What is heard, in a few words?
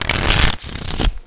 SHUFFLE, CASINO